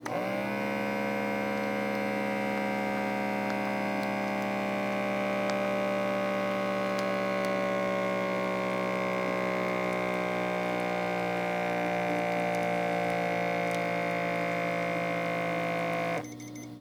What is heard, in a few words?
Blood Pressure; Medical; Blastwave